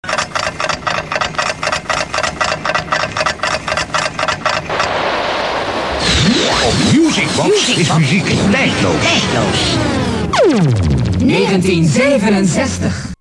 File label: musicbox; radiopiraten